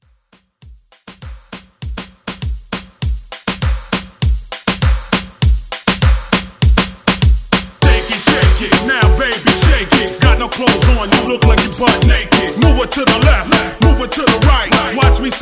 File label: Moombah Hype Edit